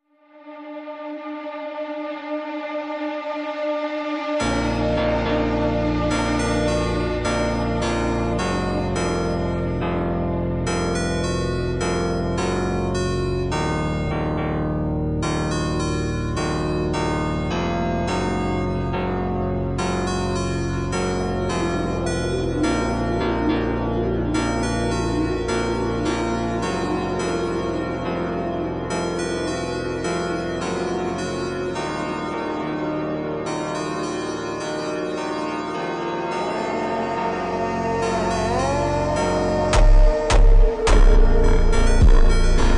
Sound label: ultra slowed reverb